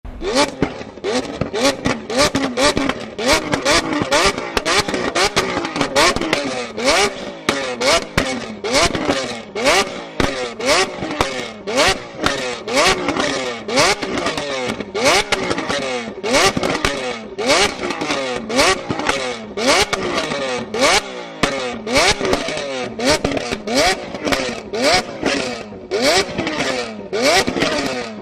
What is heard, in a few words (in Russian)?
vyhl s vystrelami lambordjyni